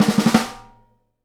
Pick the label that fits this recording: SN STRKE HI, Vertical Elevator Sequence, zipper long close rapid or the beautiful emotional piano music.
SN STRKE HI